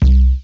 Downtube, Analog Bass; Ableton; Retro Synths; Analog Bass Vol 2

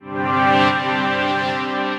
Chord Min, Synth Keys; Ableton; Factory Packs; Vibrations; ModeAudio; Impressionism

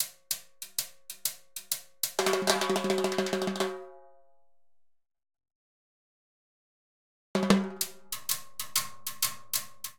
Songo 2 Timbales 96bpm, Latin Percussion; Ableton; Factory Packs

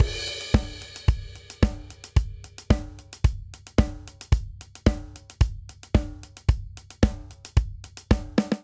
Classic Disco Verse 111 bpm, Drum; 4 4; MIDI Clips; Ableton; Factory Packs; Drum Booth

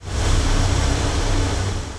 Gravity Tube Dust, dark textures; Multisampled

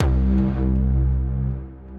Bass; Module Thunder; Ableton; Build and Drop

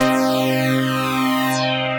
Evoe Sweep, Synth Lead

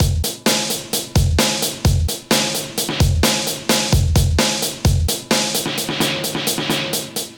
Balls 175bpm, MIDI Drum; Ableton; Factory Packs

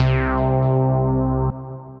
Radio Lead, Synth Lead; Ableton; Factory Packs; Singularities

